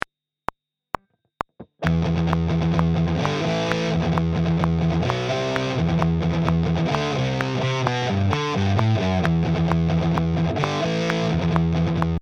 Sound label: Metal Rhythm Guitar